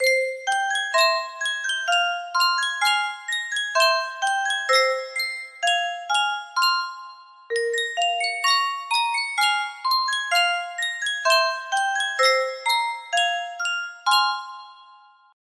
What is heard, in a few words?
sankyo music box; tetris